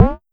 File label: Pollard Perc, Syndrum